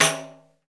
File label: TAMBO, Tambourines